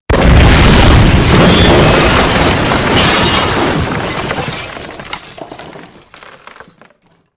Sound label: explosion debris; weapons; Soldier Of Fortune II Double Helix; XBOX; explosions